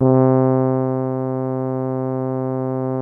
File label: BRASS 2 C2, ORCH BRASS; Greytsounds VOL-1